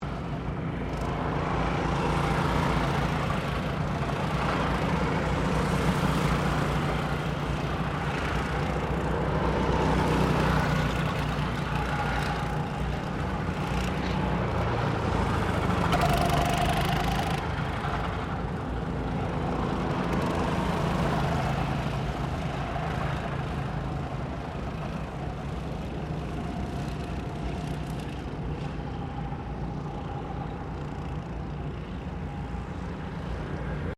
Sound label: GOCARTS SEVERAL PASSING, Gocart track; Gocart track in Queensland; Vehicles